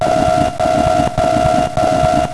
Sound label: LIQUIDP, MACHINES